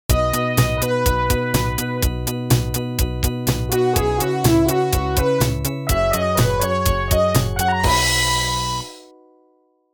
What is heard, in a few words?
Intro 124bpm